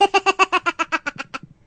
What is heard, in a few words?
Laugh, voices